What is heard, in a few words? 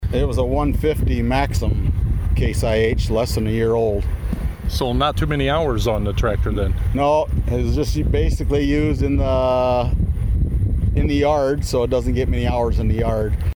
tractor fire